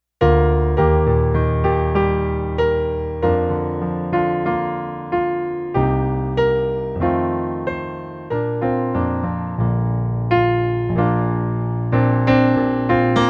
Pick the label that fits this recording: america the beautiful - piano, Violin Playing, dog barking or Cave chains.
america the beautiful - piano